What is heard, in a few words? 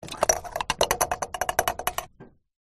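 Gas Pump Rattle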